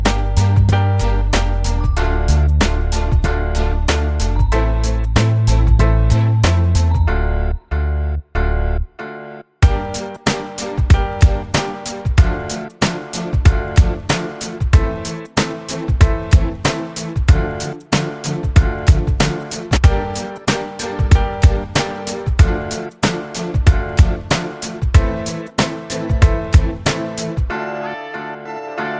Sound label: love music